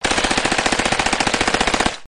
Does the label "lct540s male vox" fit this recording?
No